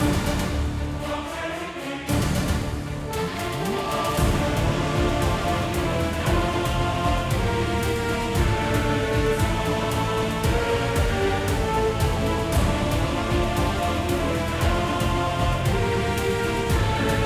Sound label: Battle Boss; Igris Phase 02